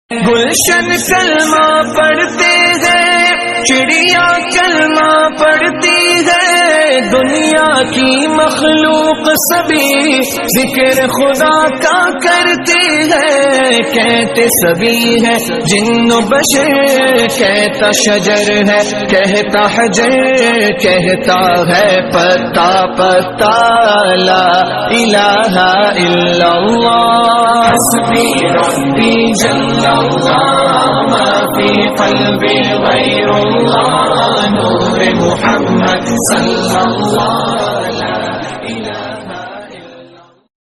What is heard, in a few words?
Hindi Song Ringtone